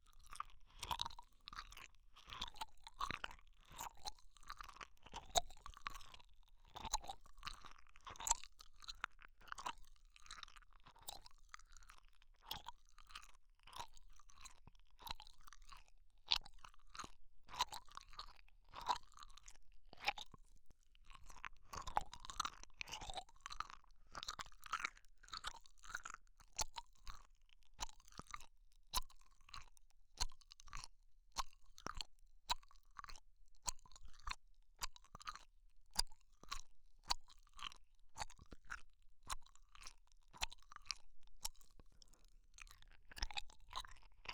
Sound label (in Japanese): ガム